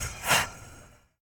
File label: capsule close